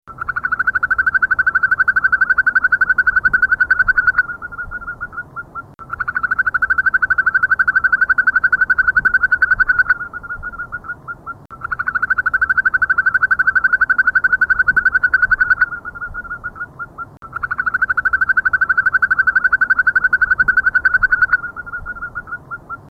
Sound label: Yosemite Toad; Davidson; Sherman